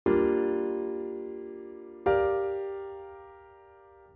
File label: Cmin9 11